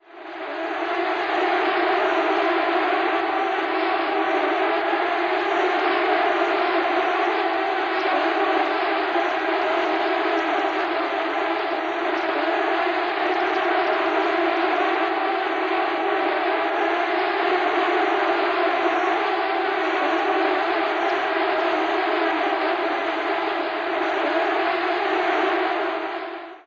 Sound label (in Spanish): los mosquitos